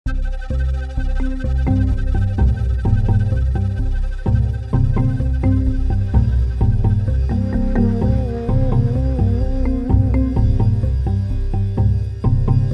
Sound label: discreet suspense; Berlin Production Music; accents akzente animals beschreibend bordun